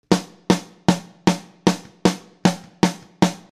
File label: snare